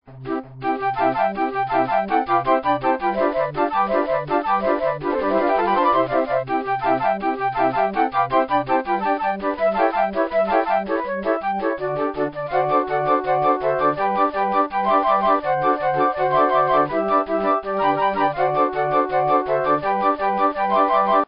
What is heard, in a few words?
Trisch trasch polka